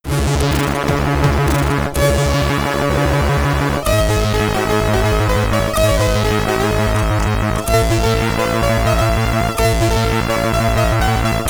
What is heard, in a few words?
SID Stereo, commodore 64; Hawkeye; Cyberload Mix #07